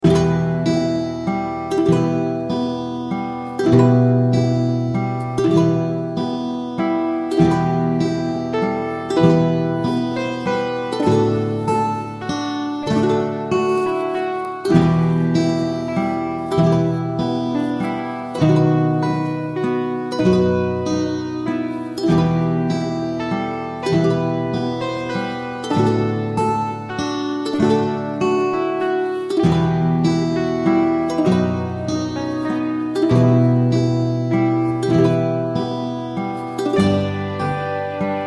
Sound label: Greensleeves, ukulele